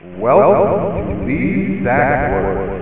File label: sax hallo